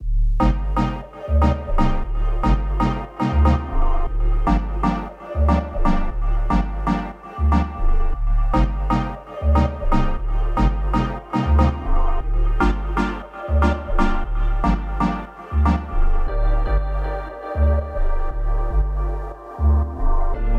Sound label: Melody Loops; 118 BPM G Min; Pacha; Cymatics